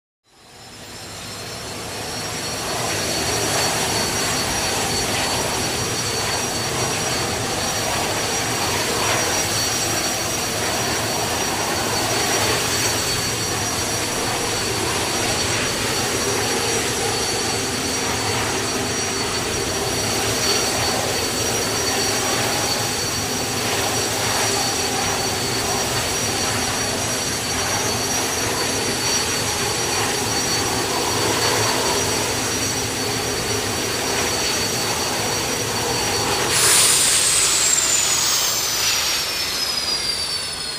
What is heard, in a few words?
Hydroplane In Off